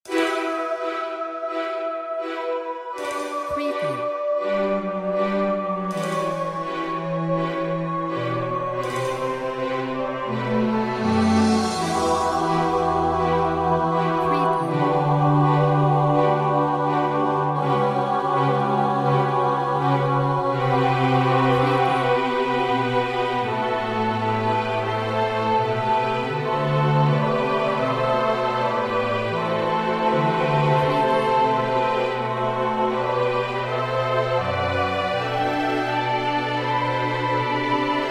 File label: Big And Dramatic